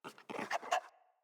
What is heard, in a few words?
Puppy Idle Pant Grunt; Enemies; Vocals; Kubrow